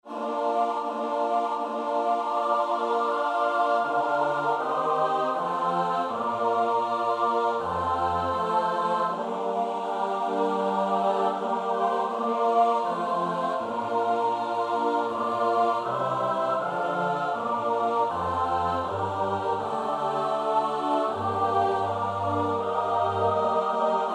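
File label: soprano alto tenor bass; Bach (Johann Sebastiaan); BWV 027; 6 Welt, ade! ich bin dein müde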